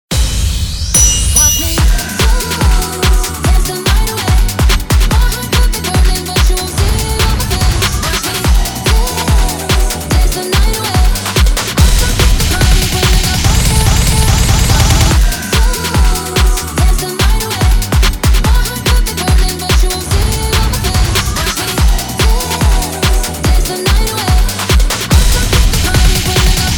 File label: Dance, CHEERS, CHANTS & DANCES; cheer camp